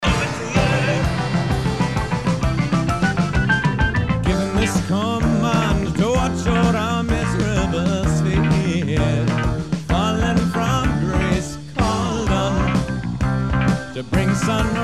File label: instrumental